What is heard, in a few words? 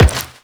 Step Metal, Footsteps; Warframe; The New War; Characters; Kahl